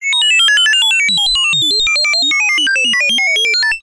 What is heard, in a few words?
science fiction robot processing beeps tone; zapsplat